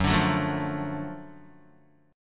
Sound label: Gb Minor Major 7th Sharp 5th Gb down Guitar; strummed; JGuitar